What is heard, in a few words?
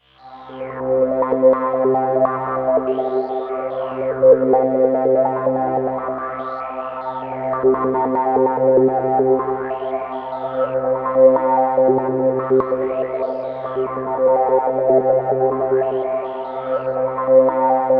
TECHNOPAD C2; Roland JV 2080